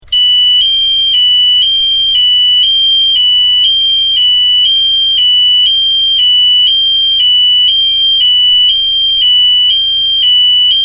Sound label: wheelock mt 24 lsm hi lo